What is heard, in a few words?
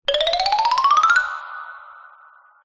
add Point